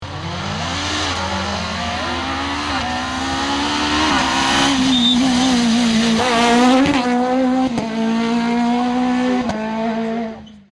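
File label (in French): saxo, rallyes; Saxo Oddoux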